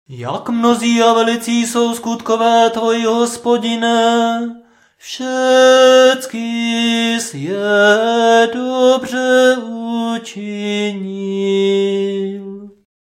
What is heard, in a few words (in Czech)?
Nedelni prokimen hlasu